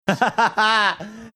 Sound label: guffawing